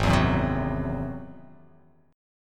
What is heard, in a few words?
G sharp Augmented 7th G sharp